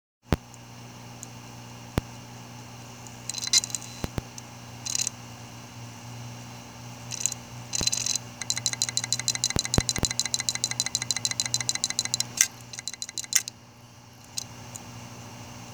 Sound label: Hitachi 500GB hts545050b9sa02; Hitachi 500GB htssa02